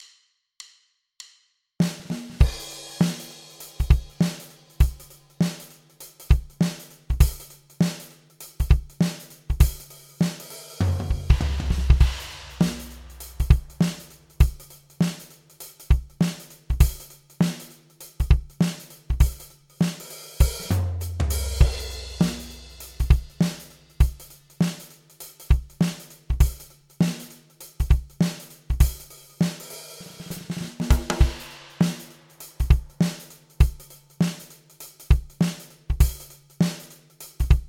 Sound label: pocket shuffled